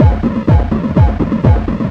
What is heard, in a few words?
turbine; Zero-G Deep House; 125bpm